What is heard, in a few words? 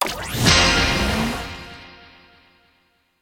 hotline Switch On